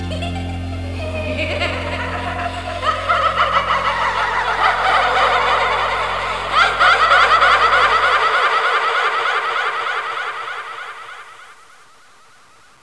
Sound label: Evil Laughter; horror; horrorgifs